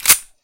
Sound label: reload; tekpro